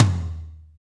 TOM TOM, Roland

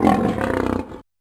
F#3 Lion, Yamaha PSR-E323 Sound Effect Kit

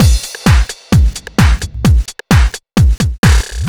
Full, Drum Loops 130bpm; Zero-G; Soundclash Nu Dance Electro; Acidized Wavs; Straight Laced

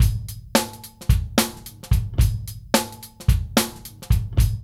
LOOP

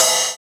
Cymbal, jungle breaks drum sounds

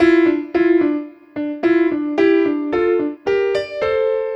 Piano, Trip-Hop Loops; Sonic Foundry (Sony Creative Software) - Rondo Brothers Trip-Hop Loops & Samples; Apologists

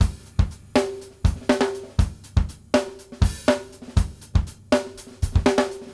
FUNKY, 120; Sampleheads; New York City Drumworks VOL-1

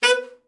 ALTO SAX; Best Service; Killer Horns

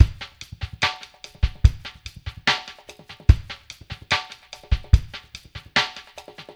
BOL FUNKY, BOLERINO, Liquid Grooves; Spectrasonics; Liquid Grooves 1 & 2

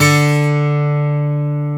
A GT D2; AKAI S-Series CD-ROM Sound Library VOL-7; A GUITAR 1

